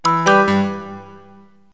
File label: synth not, music; Wired for Sound Pro CD; Aristosoft, Inc.